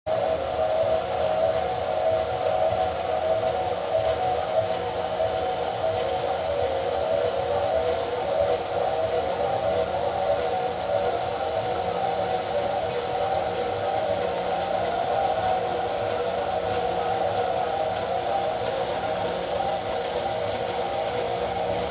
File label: ship warbling